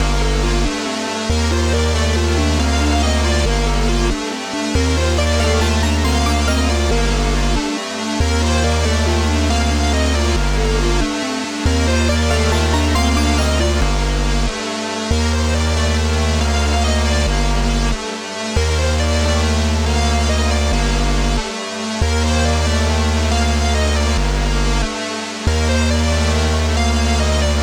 139 BPM G Min; Melody; Hemp; Cymatics; Cymatics - 2022 Melody USB Expansion

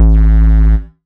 Bass A, techno synth shot; Ghosthack; Techno Synth Shots 2025

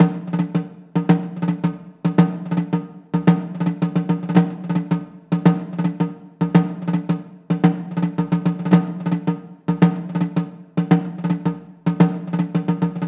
Finger Rolls - 110BPM, Percussion; Percussion Kits; STEMS; Ghosthack; SkyRealm; Kit 03 - Border Patrol

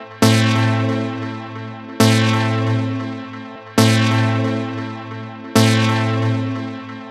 Synth Loop A 135, Techno; Ghosthack; AC2024 - Day 1 - Techno Sounds 2025

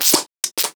smack, Cartoon impacts and smacks

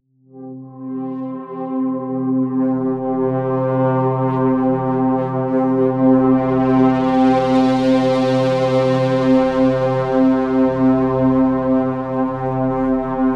Atmosphere C

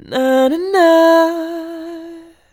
Nahnah; Vocals, AdLib; Ghosthack

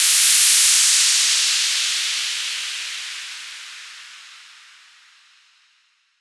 Crystallize White Noise Down; House; FX

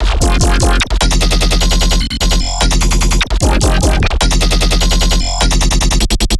Melodic Bass Loop F 150, Color Bass; Loops; Ghosthack - Color Bass Essentials

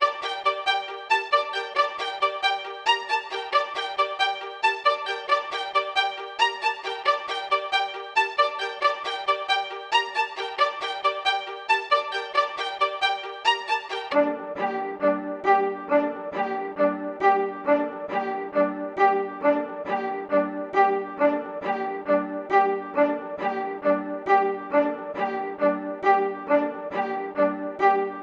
136 BPM G Min String Hits; Cymatics; 2022 Melody USB Expansion; Cutta

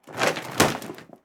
Grocery Bag Falling; Fairlight Sound Lib

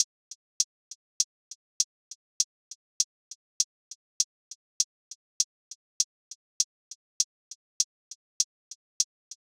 Drum Loop - 100 BPM Hihat, Hip Hop; Drum Loops; Loop Stems; Cymatics; No Se Nada

